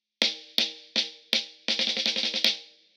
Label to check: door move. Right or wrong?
Wrong